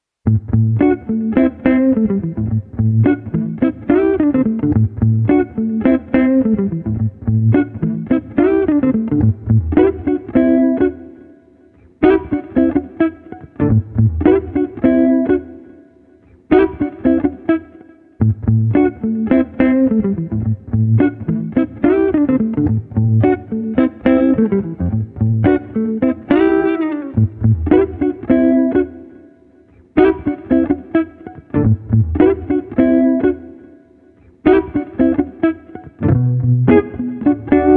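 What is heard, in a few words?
Street Guitars Bass Groovy Jam, 107 bpm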